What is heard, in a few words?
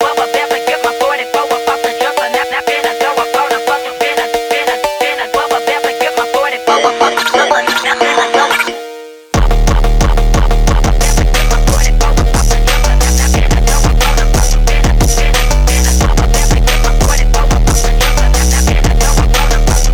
Drift Phonk